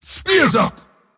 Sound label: move pain; dota; mars